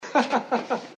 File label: laughter